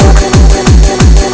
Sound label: 180 trance